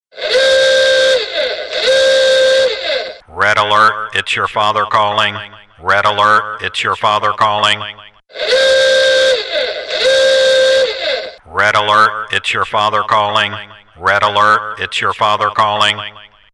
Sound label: red alert father calling ringtone